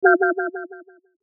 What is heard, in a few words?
Synth Flutter Delay; Musical Sound Design Sound Effects